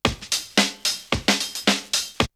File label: Drumloop; ZERO G - Datafile DISC 3